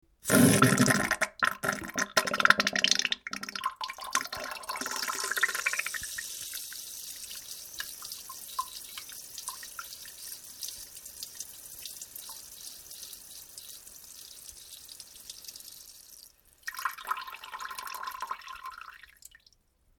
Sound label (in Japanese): 水下痢おならと一緒におしっこ, ASMR